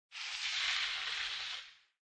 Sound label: Skiing Snowboarding Slowing Down Distant